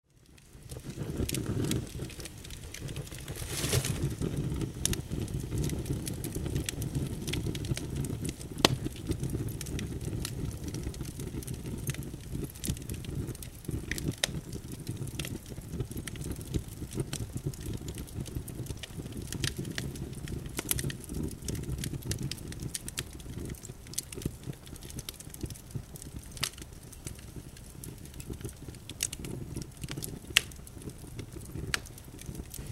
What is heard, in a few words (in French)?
silence du feu de bois